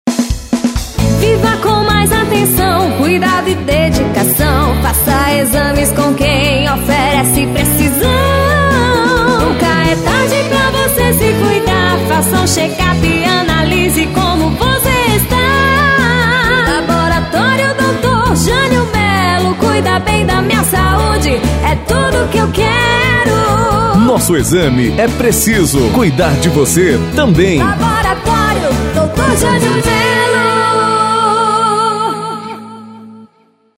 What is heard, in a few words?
JINGLE; LABORATÓRIO DR. JANIO MELO